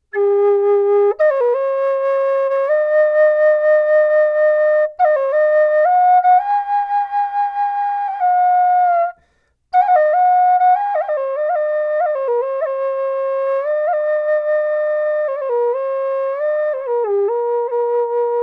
Mid G Birch, flutes

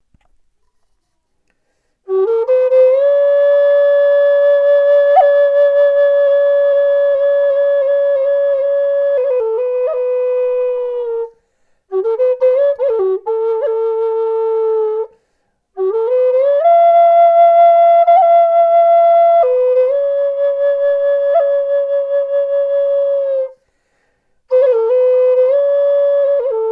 Mid G 432Hz Sassafras Cab Zig Zag, flutes

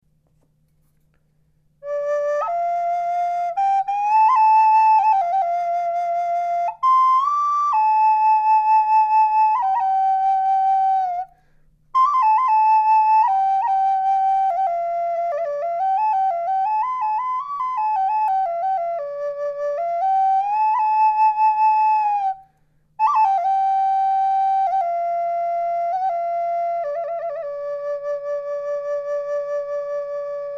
High D Cedar, flutes

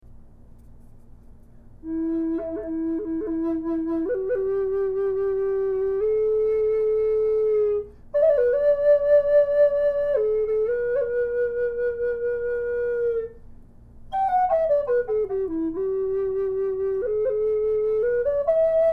Low E Myrtle, flutes

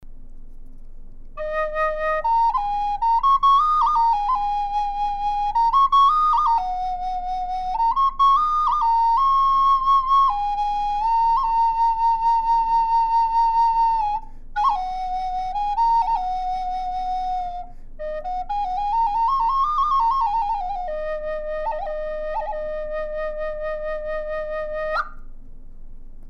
High Eb Cedar, flutes

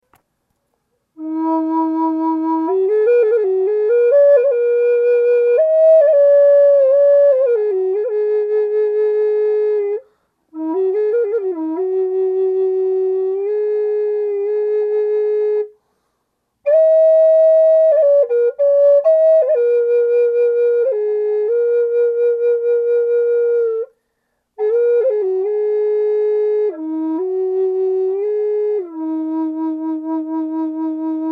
Sassafras E432, flutes

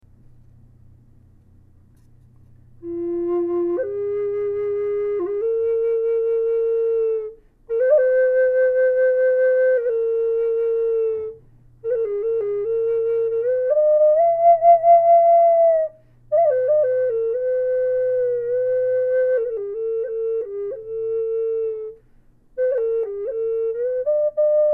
Low F Cherry, flutes

